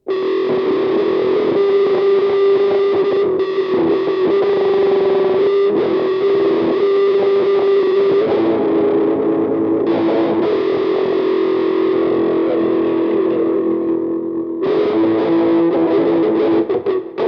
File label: electric; MegaEpix